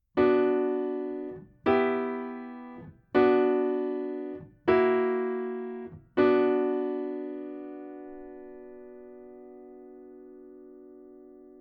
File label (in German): Harmonische Kadenzen in h Moll; Übung 2